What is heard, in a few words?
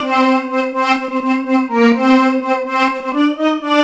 Synth Strings; dance electro